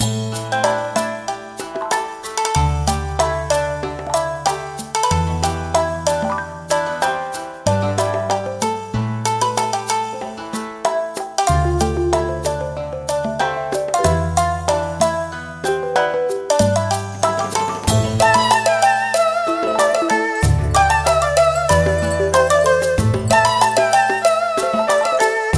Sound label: music